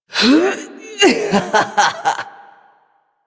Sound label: Lift Laugh, Corpus Lich; Warframe; Persona C